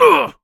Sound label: Player Life Lost; AudioWizards